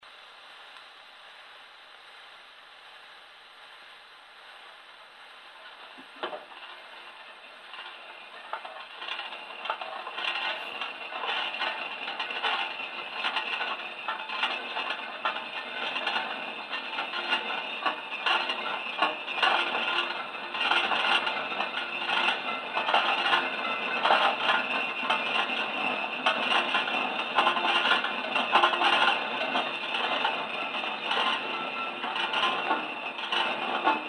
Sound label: gramophone playback; second mould